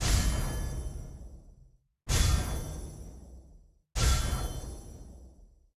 Ui Card; Archeland

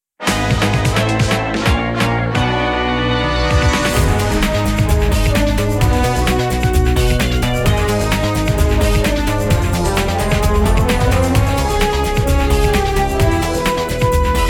Combat Music 130BPM; ps4; xbox series xs; Level 3

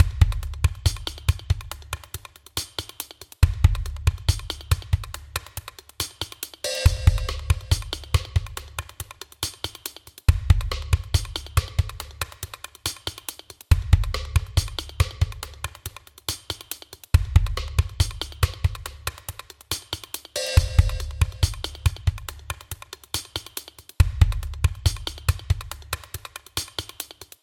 Bandits Loop Percussion Only; assault spy windows gamerip 2018

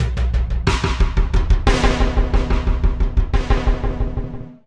90 bpm beats

